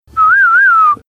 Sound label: whistling